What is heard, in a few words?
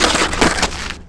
bail, skater; Tony Hawk's Project 8; XBOX